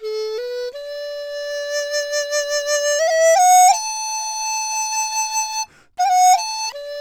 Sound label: DIZU 80 D; INIS - Opium